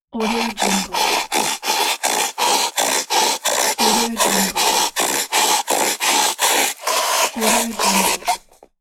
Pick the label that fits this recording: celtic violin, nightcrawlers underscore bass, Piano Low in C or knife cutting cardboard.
knife cutting cardboard